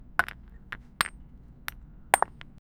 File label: two rocks colliding; collision